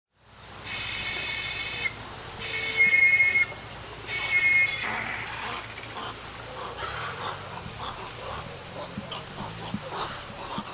Maria-faceira Syrigma sibilatrix; Jacauna

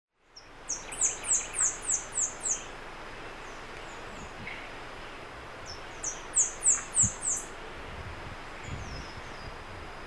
Tico-tico-de-bico-amarelo Arremon flavirostris; Jacauna

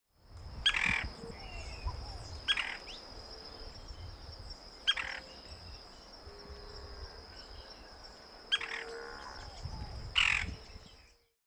Pica-pau-verde-barrado Colaptes melanochloros; Jacauna

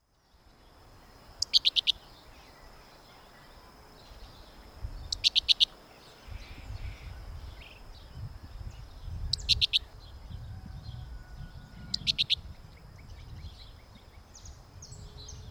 Tesourinha Tyrannus savana; Jacauna

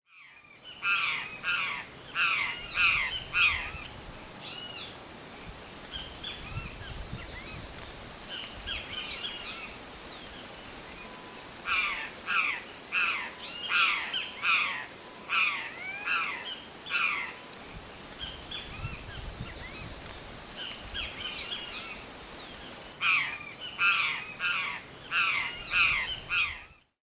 Gralha-do-campo Cyanocorax cristatellus; Jacauna

